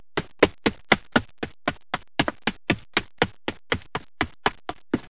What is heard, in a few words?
step